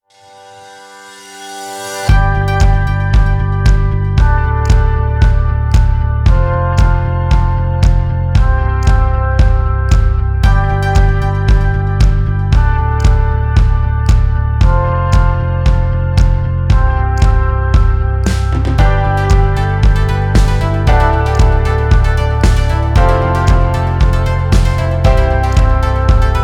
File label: Inspiring Royalty Free Production Music